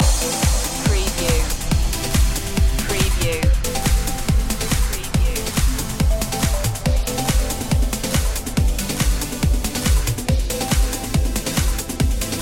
cascade loop

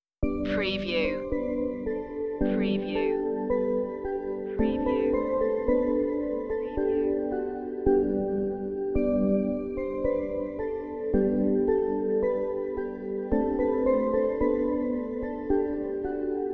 deck the halls bells keys

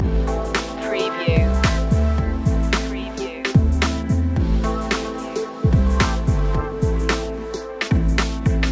fashion club loop

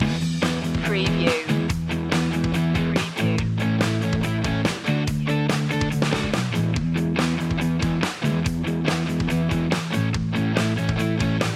celebrate loop

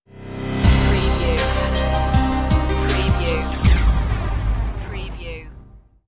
lifeline stinger

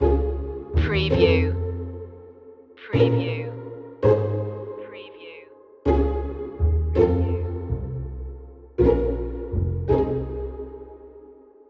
entropy humor loop

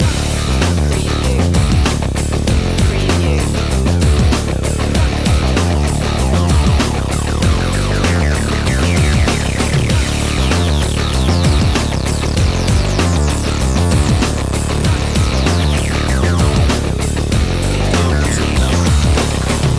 drum loop

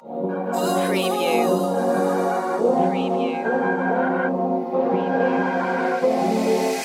smooth soul vibes loop intro

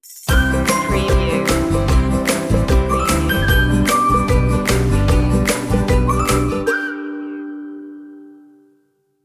stinger

